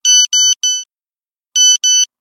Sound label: Nokia, sms; mobile